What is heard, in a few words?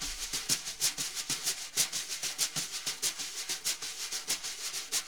BRUSH LOOPS